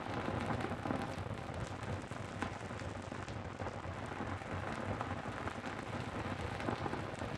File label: Maze Retro-Game Torch Loop; viewfinder